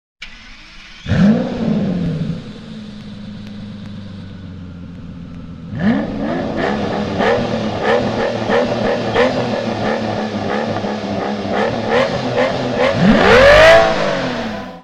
Vanquish - point mort, aston martin

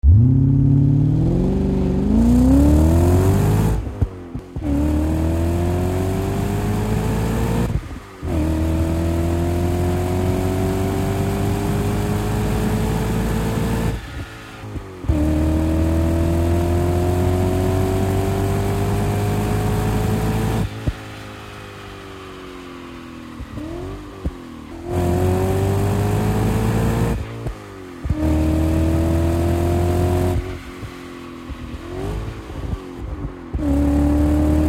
M5 a bord; bmw

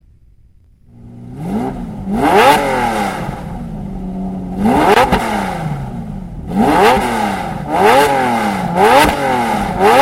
Diablo point mort; lamborghini

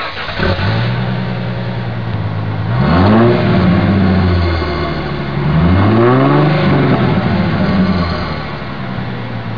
Mustang - point mort; ford

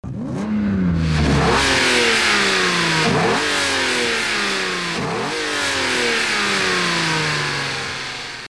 SL55AMG - point mort; mercedes

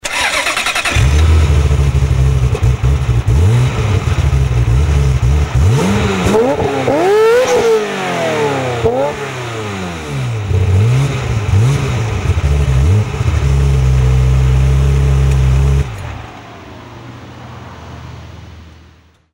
F355 - point mort, ferrari

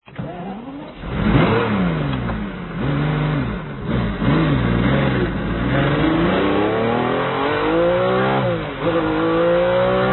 F50 - a bord, ferrari

